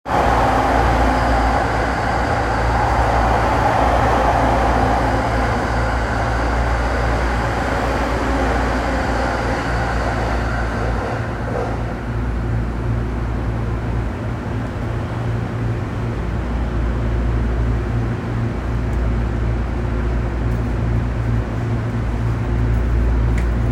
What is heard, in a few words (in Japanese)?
千代田線綾瀬北